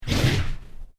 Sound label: Created Creature Medium Exclamaition, Alien Sound Effects